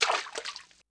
Water Step Right; Movement

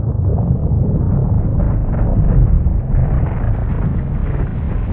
quake

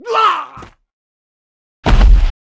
Mob die; 묘족; 양왕; 2단계

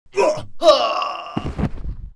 mob die; Champion; 금전방; 무적폐랑

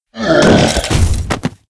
Mob die; Monster; 뇌영대; 뇌영조장

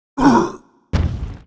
Mob die; Monster; 회색설인

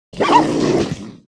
Mob die; Monster; 훈련된설랑

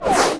run; Monster; 만리보

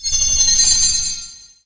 skill particle

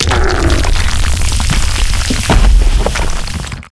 Mob die; Monster; 패왕릉; 근위병

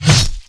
MOB BATTLE WOODSTICK, Battle Mob Weapon

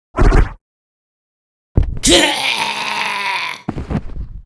Mob die; 몽면마불

